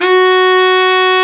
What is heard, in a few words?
BRA BARI, Bariton Sax